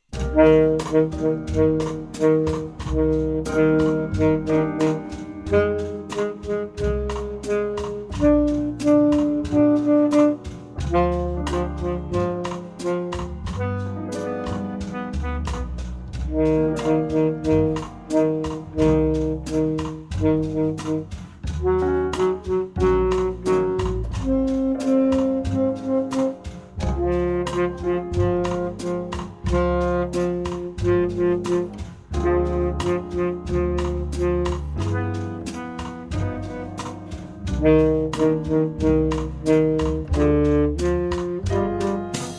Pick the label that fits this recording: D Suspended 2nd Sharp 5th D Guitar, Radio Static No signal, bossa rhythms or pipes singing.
bossa rhythms